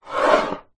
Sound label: household glass perfume bottle drag on wooden dressing table